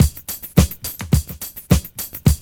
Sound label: LOOP; E-Lab; X Static Goldmine 2; akai